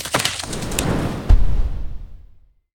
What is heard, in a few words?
tree fell